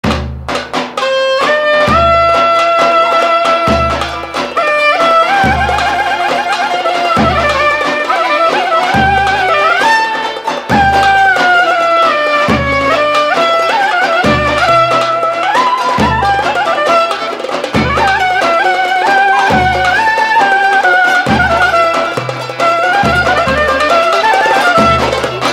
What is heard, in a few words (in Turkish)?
Klarnet zil sesi